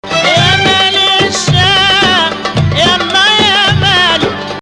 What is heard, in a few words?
Rast Ya Maal 2nd Rast